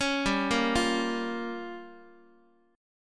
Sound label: G Minor 7th Flat 5th, Guitar; Gm7b5 arpeggiated; JGuitar